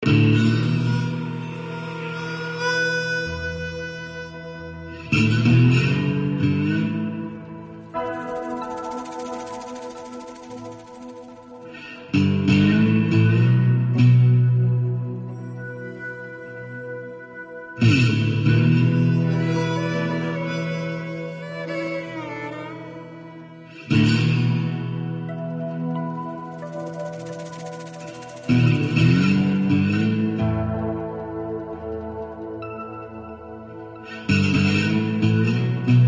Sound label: Loop Ambient